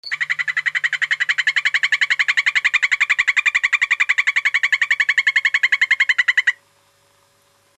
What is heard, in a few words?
P terribilis, chants